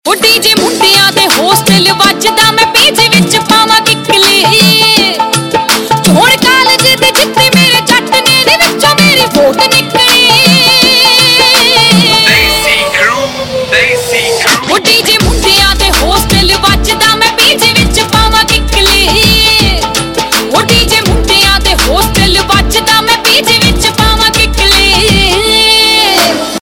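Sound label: Ringtone, Punjabi Pop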